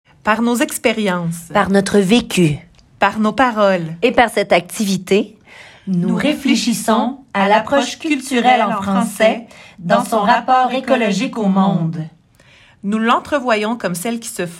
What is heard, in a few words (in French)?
choeur final; Chapitre francais